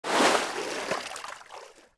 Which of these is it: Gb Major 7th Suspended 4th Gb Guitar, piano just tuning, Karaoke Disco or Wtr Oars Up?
Wtr Oars Up